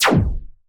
Noiz Laser

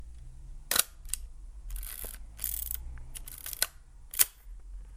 Bronica

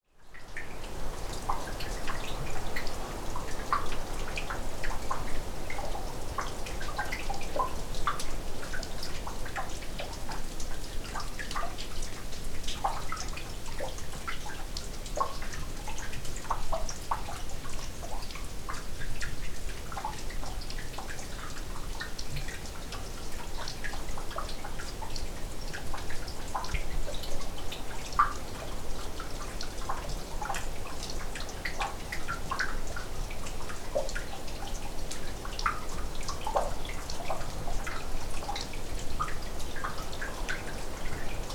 Wind Outside Cave; Bower S Cave Ut

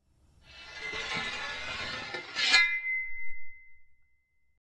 Sword Drawn

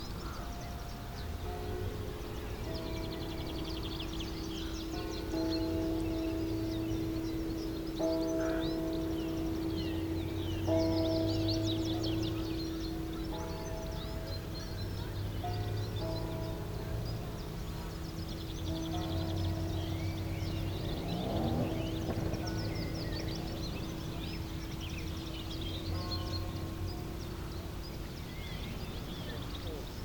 River Church Bells

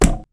Heavy Impact

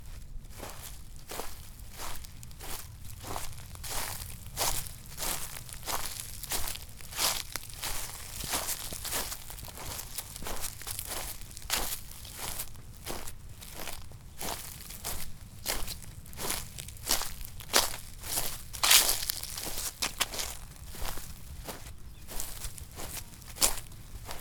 Walking outside

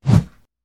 heavy whoosh sound

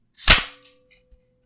Snap Stapler Close

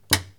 Button

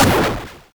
Shoot

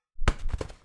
Toilet Paper Drop

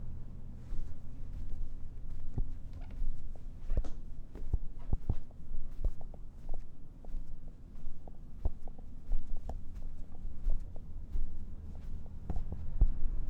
Walking On Carpet

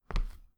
Hard Female Footstep

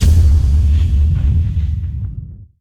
Bass Impact

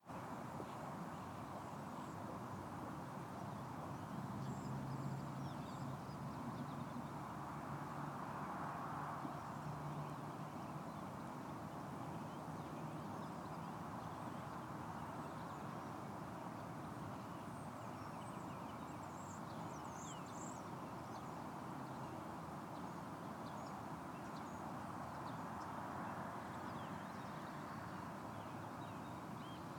Country Ambiance

